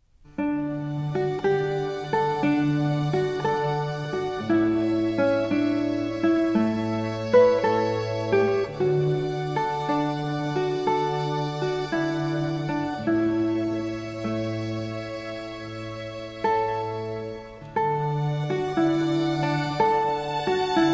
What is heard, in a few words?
Concerto For Lute, 2 Violins and Continuo in D major; RV 93; Vivaldi